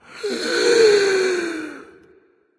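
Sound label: nonverbal breath; smite; voicepack; ullr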